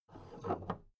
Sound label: big plastic case handle take; objekte; kiste